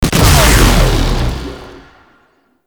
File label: Infested Shotgun Alt Fire Shot; Weapons